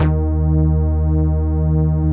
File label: PUNCH BASS; BASS SOUNDS